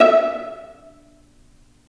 STR PI, Roland String Master Series, Vlas Pizz

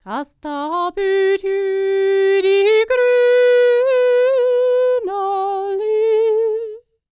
SCANDINAVI, World Voices; ILIO; Vocal Planet VOL-5

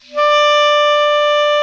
KLARINET E 3

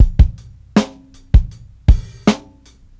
beat 80bpm, Hip-Hop Street Beats; Bronxbeat; Hip-Hop Street Beats Construction Kit; Street Beats by Poogie Bell

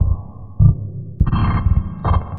Monster Masher Beat, Electronic Beats; Sonic Foundry (Sony Creative Software); Myths of Technology

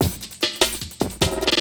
LOOP, 150 BPM, Drum & Bass

